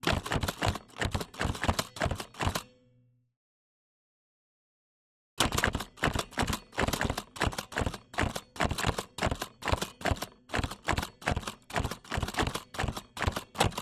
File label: Typewriter Olivetti Typing on keys without cover slow speed, Olivetti Linea 98; Contact; Dramatic Cat